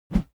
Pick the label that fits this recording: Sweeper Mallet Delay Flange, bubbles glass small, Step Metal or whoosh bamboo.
whoosh bamboo